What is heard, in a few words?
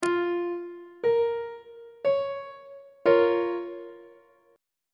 b flat minor 2nd inversion on treble clef